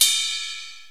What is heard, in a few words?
crash sample a sharp key, Royality free crash cymbal sound clip tuned to the A# note.; cymbal drum samples